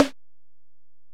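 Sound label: Acoustic Percussion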